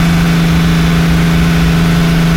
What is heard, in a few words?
engine loop; soundscrate